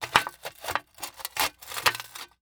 Foley Tin Crush Rubble

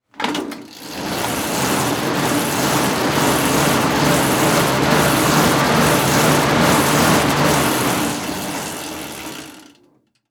Sports Bike Pedal Exercise Bike Short

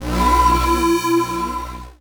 Transition Digital Scanner; Production Element Title Transition

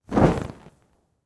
Fire Torch Movement Flare Up; Fire and Explosions

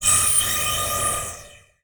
Transition Metallic Phaser; Production Element

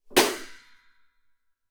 Impact Metal Thin Metal Rack

